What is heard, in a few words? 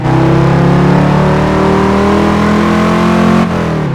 third, vehicles; viper